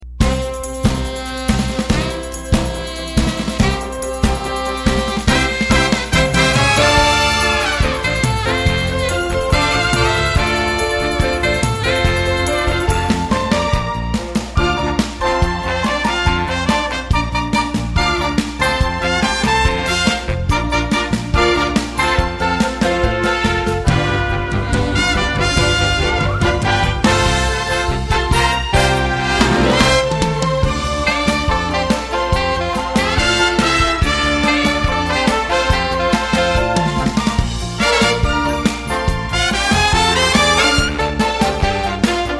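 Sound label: exit music accompaniment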